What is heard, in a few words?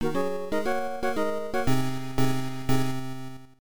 Battle Lose; madou monogatari 1-2-3; pc-9801